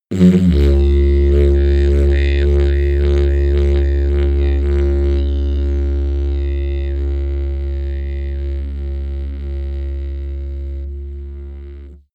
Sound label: didgeridoo jump slow